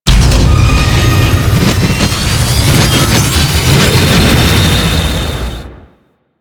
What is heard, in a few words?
Large Spinning Wheel Malfunction; Warframe; Corpus; Railjack